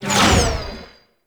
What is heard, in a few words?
Mirror Defense Rune Shot Cancel; Ambience; Gameplay; Warframe; Entrati